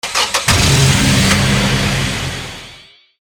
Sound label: tank startup